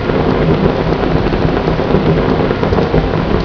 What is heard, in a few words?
burning, fire; hl2